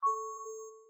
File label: fingerprint progress; LG V20